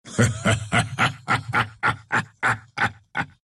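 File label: laugh, Russian; Dota 2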